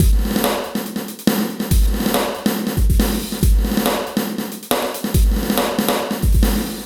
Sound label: E Kit, Drums & Percussion, Electronica; Sonic Foundry (Sony Creative Software) - Electronic Point Blank